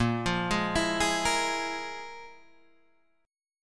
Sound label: F Minor Major 7th Double Flat 5th